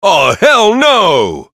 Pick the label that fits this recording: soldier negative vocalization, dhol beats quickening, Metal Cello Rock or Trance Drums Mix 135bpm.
soldier negative vocalization